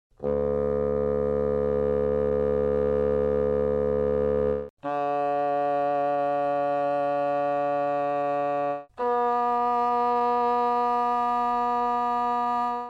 basson

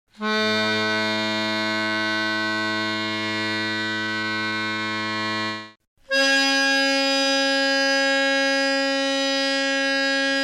Accordia

